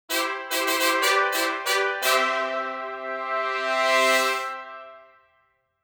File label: Hypermaze Success Trumpet